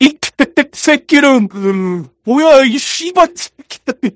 laugh happy; voice packs; scout

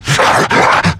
death, male zombie; nmrih; zerosiki; slender

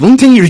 pain sharp

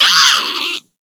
hurt; scarlet; slender

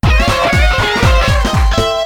victory; tf2ware ultimate; music game

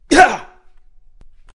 hurt; cof; simon

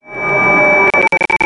stranger death; cof

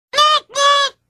noot, penguin; potry v2

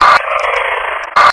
alert

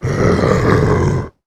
alert, male zombie; nmrih; zerosiki; slender

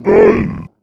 attack, male zombie; nmrih; zerosiki; slender

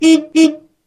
horn, kart horns

